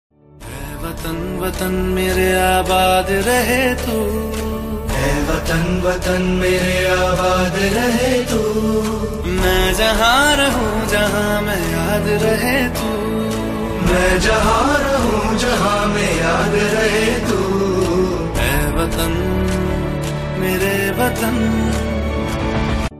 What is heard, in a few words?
Republic Day Ringtone